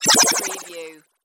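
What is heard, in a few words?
Production Element Chopper; Sound Effects Bible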